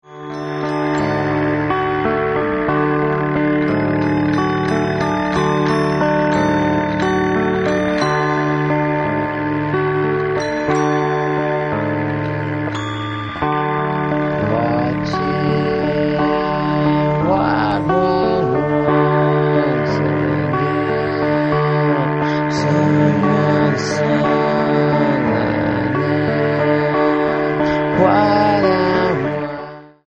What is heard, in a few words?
lullaby